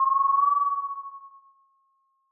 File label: Sound of an owl